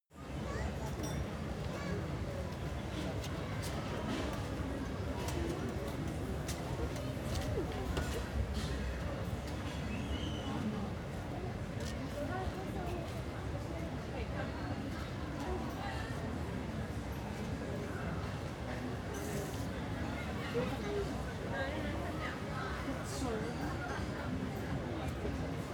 park playground kids calm

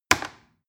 plastic button little click heavy fast

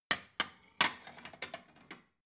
plate shelve slide soft

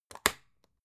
folder lever arch close

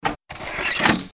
mechanic noise

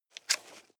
nylon leather clip scrape

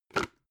plastic bowl grab

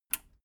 metal button radio release power off

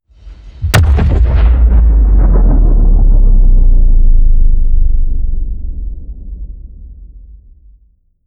explosion

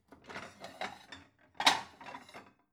plate multiple take soft

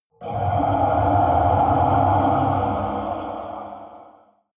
underwater rumble

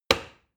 plastic button start push heavy

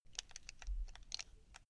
buckle rattle short slow

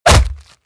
stylized fist punch

